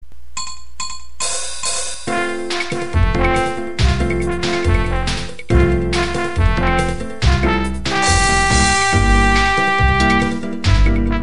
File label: bossa nova